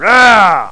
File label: Arrr; ForestFight